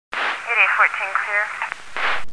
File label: radio